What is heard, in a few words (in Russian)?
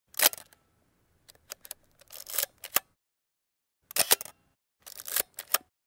zvuk camera